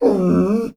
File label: dog yelping; Hearts of Iron IV Death or Dishonor